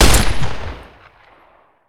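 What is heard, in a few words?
Weapon 10mm pistol fire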